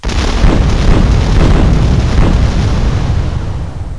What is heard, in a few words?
FIRE; agesail